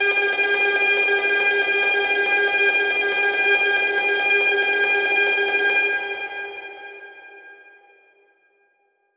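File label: Echo Processed, alarm siren samples 125bpm; musicradar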